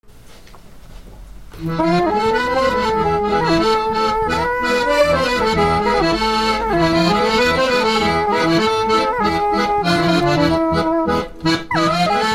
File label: klez freylekh sax